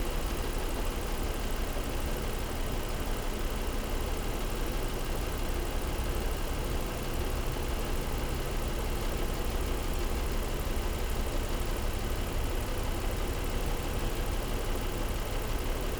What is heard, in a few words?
vehicle racingcar idle; teardown; ps5 windows xbox series xs